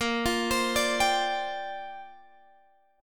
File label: Bb 6th Add 9th Bb Guitar Open G